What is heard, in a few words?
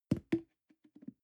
plastic can small fall bounce soft hollow